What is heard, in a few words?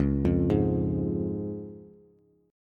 Db Major Db Bass; arpeggiated; JGuitar